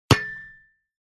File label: post, ball; punt